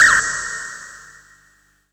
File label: FX BIRD; M8; M8JUNGLEPAK1